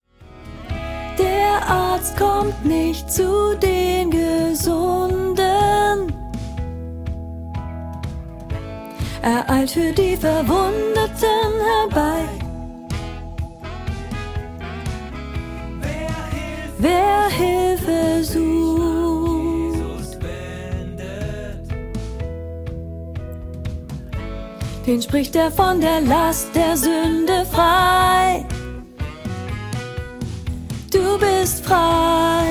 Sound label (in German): Lern Sopran; Gerth